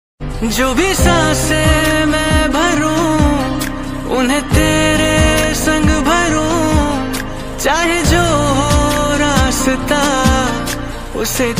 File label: hindi ringtones